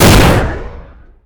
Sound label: Shotgun Shot, Weapons; SFX Kit